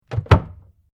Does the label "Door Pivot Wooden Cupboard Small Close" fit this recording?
Yes